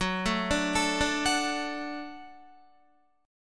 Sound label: Gb Minor Sharp 5th Gb down Guitar; Gbm#5; arpeggiated; JGuitar